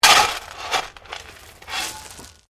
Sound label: Digging Shovel Pebbles; Various digging tools and processes; Construction Sound Effects